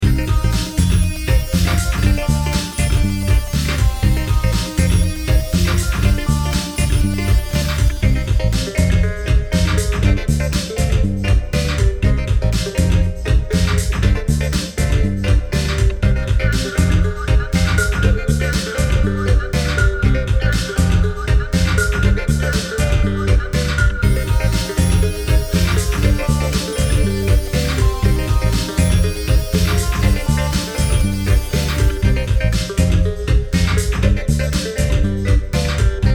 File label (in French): musique